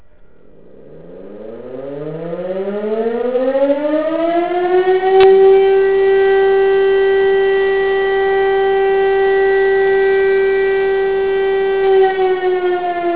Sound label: Sirene; Cunnersdorf; sirens